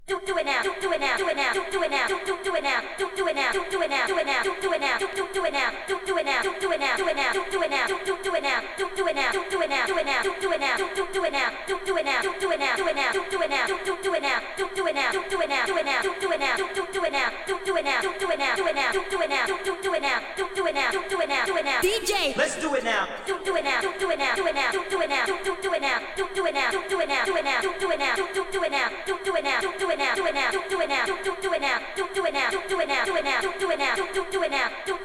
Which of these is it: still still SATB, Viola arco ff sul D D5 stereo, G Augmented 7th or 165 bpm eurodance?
165 bpm eurodance